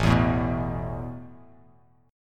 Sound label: A Suspended 2nd A down, chord; strummed; JGuitar